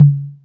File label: E Tom; PLUTO KIT